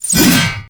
Backstab Impact Target; Warframe; PaxDuviricus

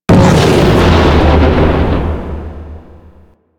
Antimatter Drop Explosion B; Warframe; Warframes

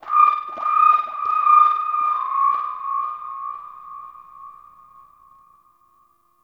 Sentient Texture Medium, Ambience; Sentient Lair

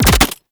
Concrete Archgun Mech; Warframe; Archwing

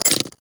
Rifle Mech, Weapons; Warframe; Tenno; Gyre

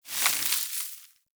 Hoverboard Terrain Caster Snow; Warframe; Vehicles; Venus

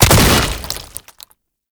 Strike Leap Hit; Boss; Enemies; Abilities; Warframe; Oraxia

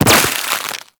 Proc Cold; Warframe; Mods

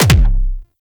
Incarnon Wrist Gun Punch, Weapons; Warframe; Tenno

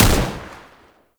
AK47 Fire; Warframe; Tenno

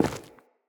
Grunt Step Wood, footsteps; Enemy; Characters; Corrupted Sproutfolk

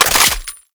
Exalted Sniper Reload; Warframe; Frumentarius

